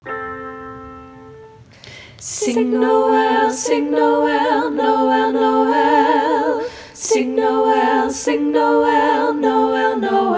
African Noel SATB; Gospel Choir

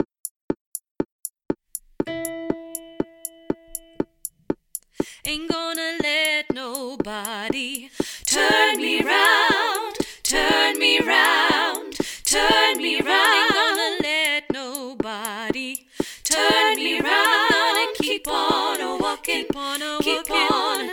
Tutti, gospel choir

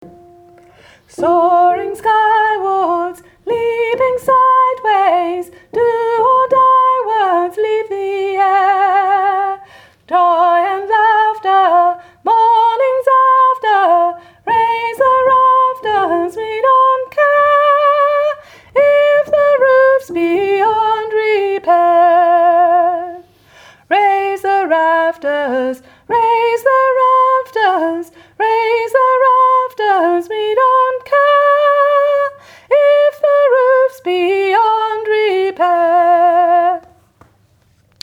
Unison in Harmony Sops

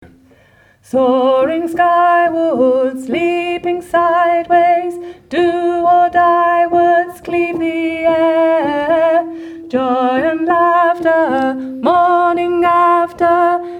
Altos; Unison in Harmony

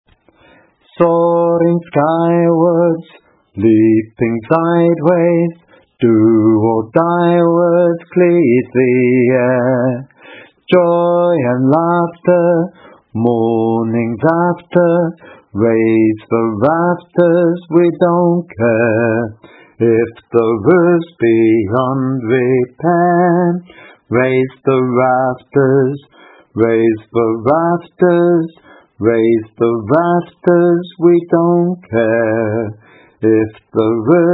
Bass

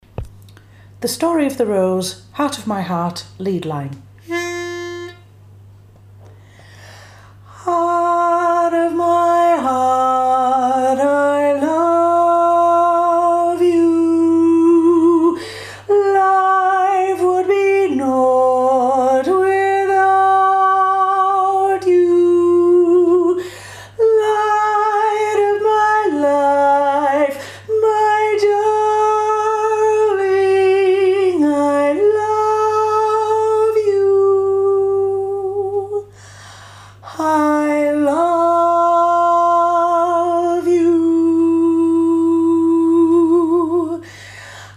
Lead, Gospel Choir

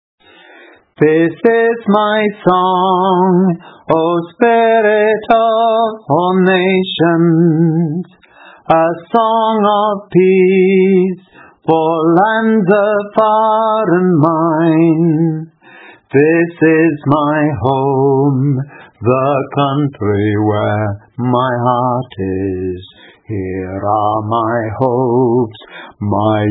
Findlandia Bass

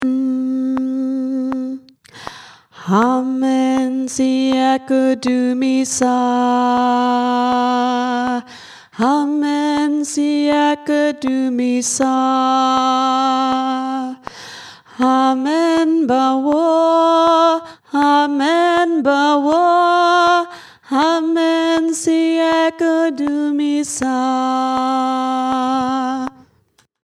Alto, gospel choir; Amen Siakudumisa

